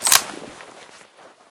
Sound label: rifle slide back; Left 4 Dead; Source Engine